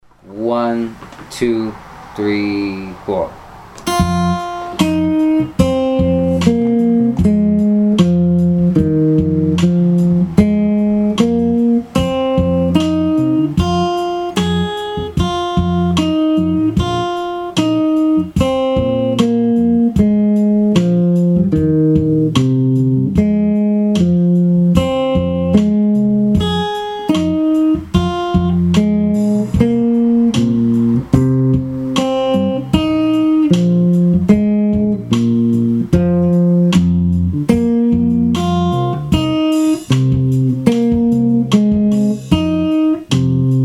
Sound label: blues